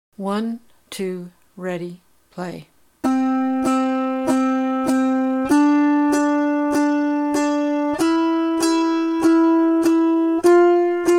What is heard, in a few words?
C Scale 4 plucks up and down